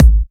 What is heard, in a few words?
Dubstep Kicks; Vengeance - Essential Clubsounds Vol.5; Bassdrums